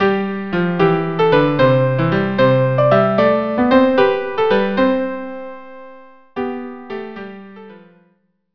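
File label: violin with viola; Sellingers round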